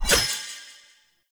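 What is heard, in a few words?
Long Blade Projectile Rune Sword Throw; Powers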